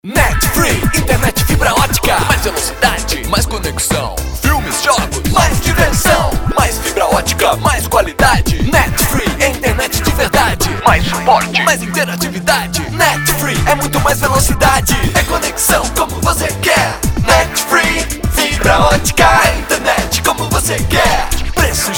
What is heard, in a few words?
jingle